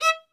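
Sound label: solo violin, strings; ILIO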